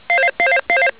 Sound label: hold beep